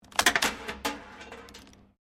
SLOT MACHINE BB TARGET PISTOL SHOOT, Various old coin slot machines